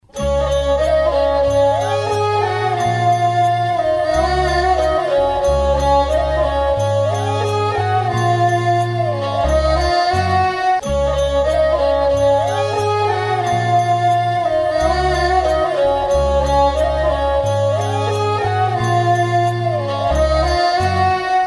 music

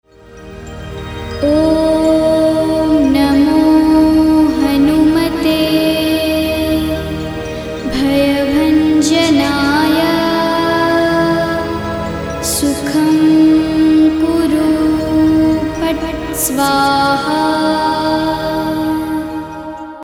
HANUMAN MANTRA

